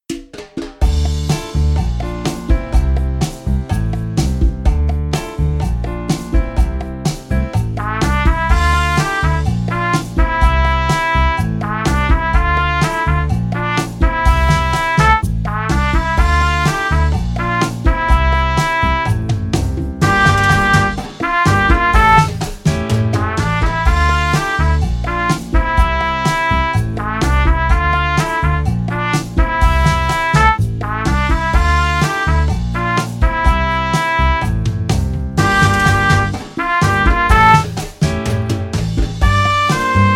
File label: Trumpet; easy beat vol 1 trompete